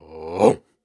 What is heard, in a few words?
Grunts and Chants, Viking Vocal